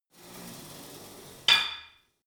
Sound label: pullup machine cable release weight impact; sport freizeit; fitnessstudio